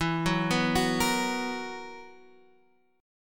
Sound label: B Major 7th Suspended 4th, guitar chord